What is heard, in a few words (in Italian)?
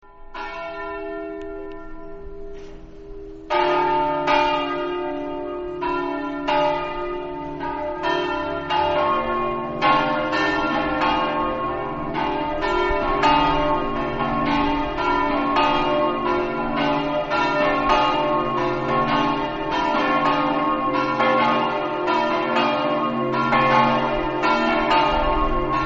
standrez, campane